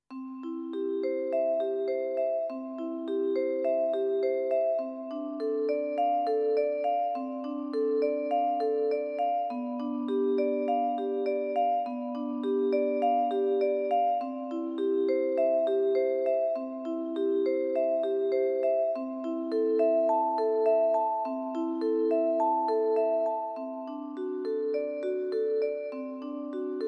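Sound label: Vibraphone, digital piano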